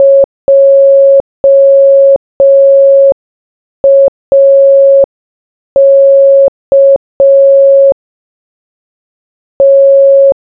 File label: morse